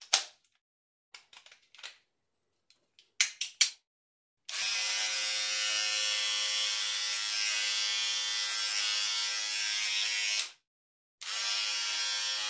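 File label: hair clipper sounds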